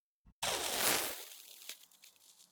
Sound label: Fly By Ignition